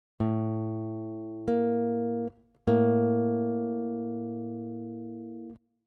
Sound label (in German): Intervalle kleine None